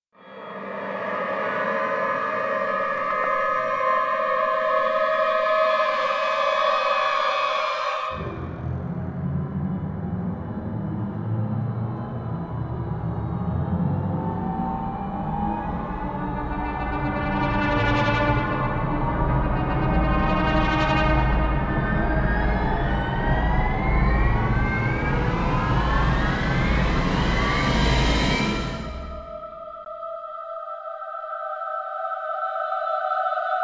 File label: inside armor ambience; F.E.A.R. 2: Project Origin